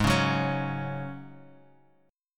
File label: Ab 7th Suspended 4th Ab down; Ab7sus4 strummed